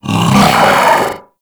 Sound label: Pet Vocal Pain; Warframe; Alad V Pet